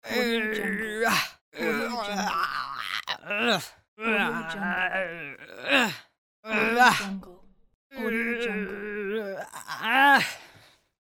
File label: teenage boy effort yell pack